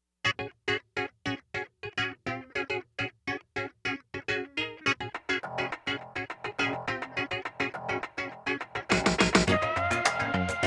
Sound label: semiaquatic samba; capybara